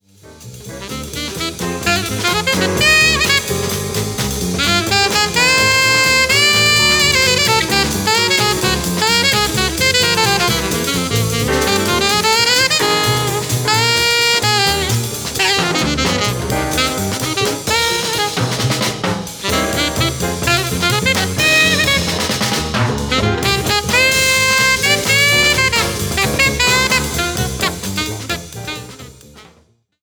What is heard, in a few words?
jazz sax